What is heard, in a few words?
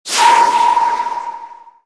superspeed use